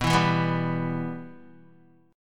B Suspended 4th Sharp 5th B down Guitar, Bsus4#5 chord; strummed; JGuitar